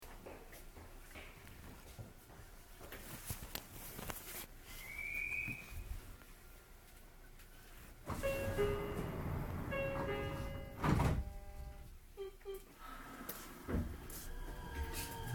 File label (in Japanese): 4000系, 名鉄瀬戸線初のIGBT-VVVFインバータを使用した車両; 守山自衛隊前〜矢田（瀬戸線普通）; 名鉄瀬戸線初のIGBT-VVVFインバータを使用した車両で、名鉄の通勤型車両としては初の、ドア上の液晶画面を搭載しています。